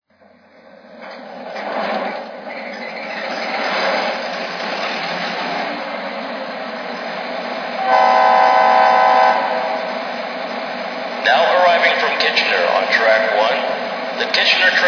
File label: f40ph, trains